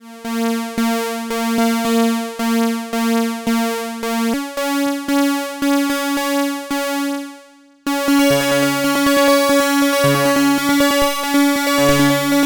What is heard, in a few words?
matrix1000 Pitch Problems; oberheim